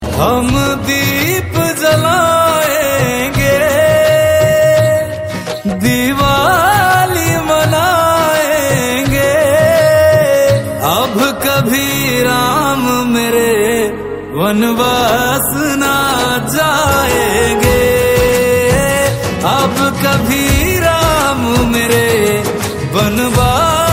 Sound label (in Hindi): Bhakti